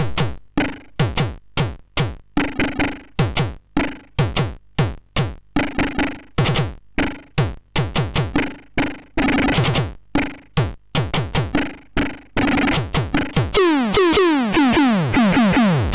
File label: Drum, MOS6581R3